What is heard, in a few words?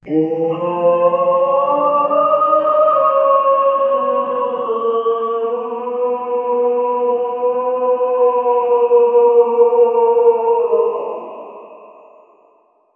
the angels cry is a; angelic